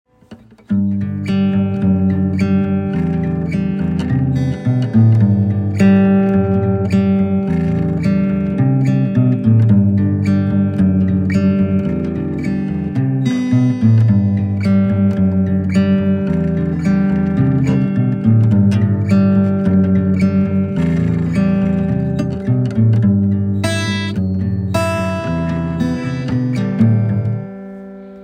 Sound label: Little Riff